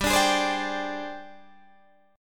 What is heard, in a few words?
G sharp Major 7th Flat 5th